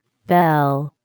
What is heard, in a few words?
bell